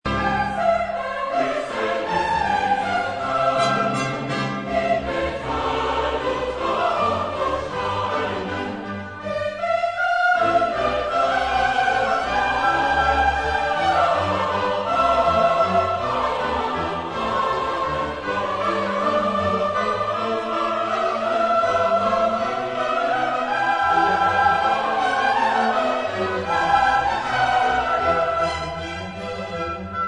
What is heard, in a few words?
Cantata, chorus; Bach; BWV63; Christen atzet diesen Tag in Metall und Marmorsteine